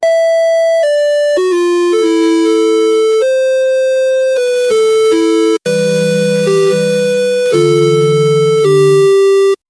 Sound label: sad song